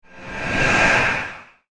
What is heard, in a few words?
Torture Chamber Transition, scary soundscape; horror sound effect